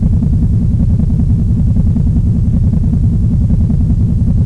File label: city rumble loop